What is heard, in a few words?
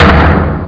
drama pound